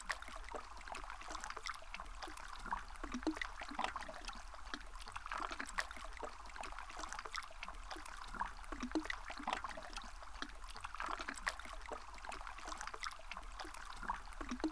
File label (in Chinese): 水花木头; 海浪聲; 自然音療